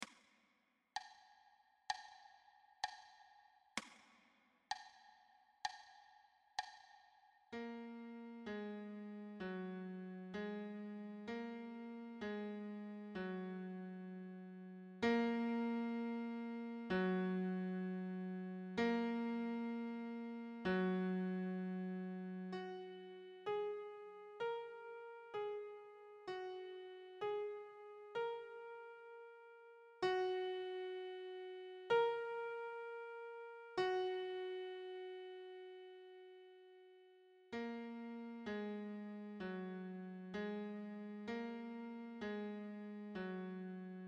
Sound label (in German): Glockenlaeuten; Klavierschule für Kinder